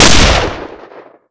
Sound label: barrel; wartso; weapons